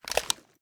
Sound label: reload empty fast start; gmod